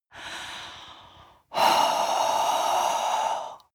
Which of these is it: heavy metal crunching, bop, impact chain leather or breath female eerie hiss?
breath female eerie hiss